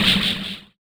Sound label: Fish catch; Cruelty Squad